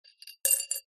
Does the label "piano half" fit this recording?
No